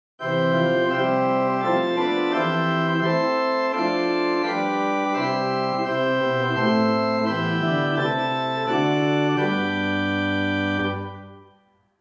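Open Diapason small 8 + Principal 4 + Fifteenth 2, organ; St Annes Moseley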